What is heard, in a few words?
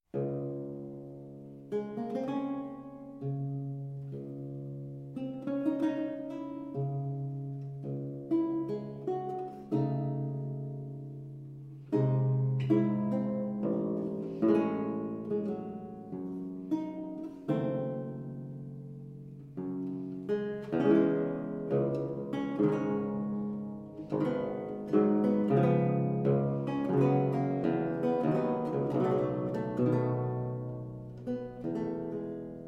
Prelude en re min; Robert de Visee; Airs De Cour Francais Du XVIIe Siecle